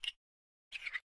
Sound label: spin, coppergolem; Minecraft